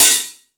Dance, Drum Machines

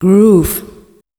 PHRASE, Voice Spectral

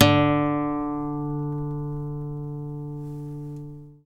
GTR D2 HARD, Nylon Guitar

